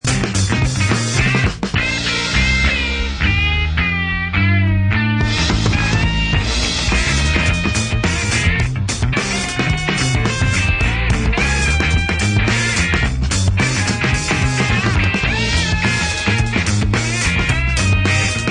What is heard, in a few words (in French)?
Melody (Violon Electrique Solo Complet)